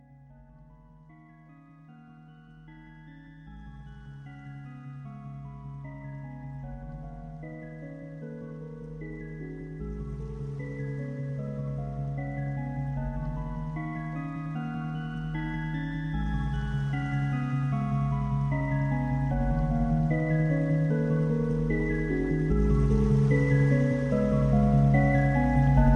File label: music